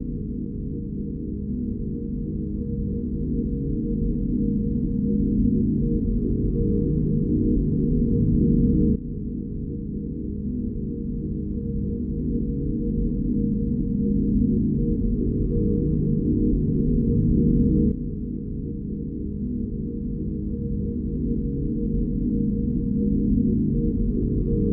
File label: dronesweep